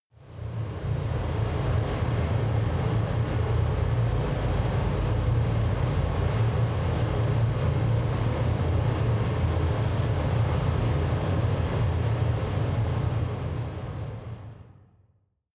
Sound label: factory hall